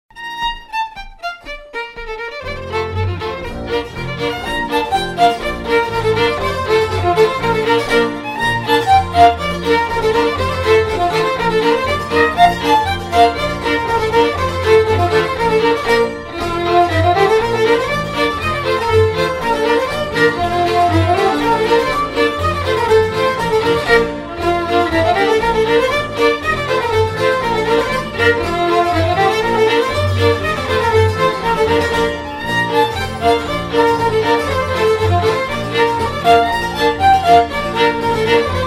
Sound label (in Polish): Kapela polka